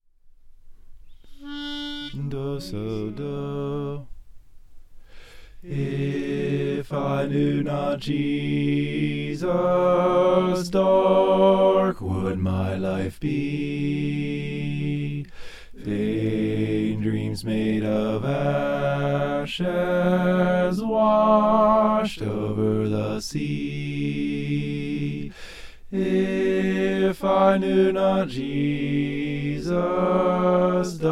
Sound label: bass